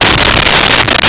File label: impact mellow